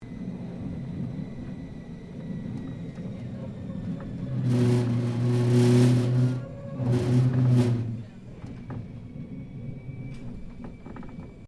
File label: Tram Melbourne W Class Interior Moving Rattling; Melbourne Trams; Streetcars (Sound Effects:Vehicles)